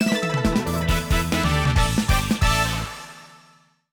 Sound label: Jingle Fishing Start; Tsuri Spirits; Nintendo Switch